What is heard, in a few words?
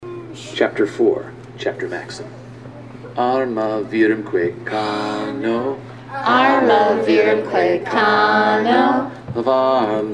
4th Grade Latin Chant